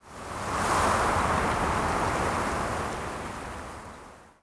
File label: wind TREES; The Elder Scrolls III - Morrowind; Morrowind GOTY GOG